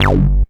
GALAKTIC G1, bass; Loopmasters; Jack Sparrow; Deep Dubstep Progressions

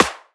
Snare, Dubstep One-Shot Drums

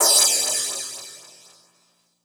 Crash, Cymbals

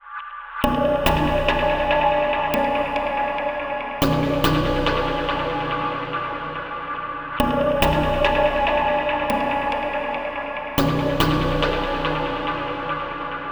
Bpm142 Chord Loop E; Airy; SpyingGlass; Prime Loops; Dubstep Illusions

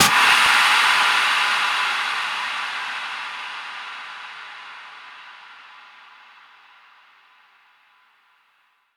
FX Impact

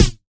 Snare, Dubstep One-Shot Drums

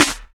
Claps & Snares

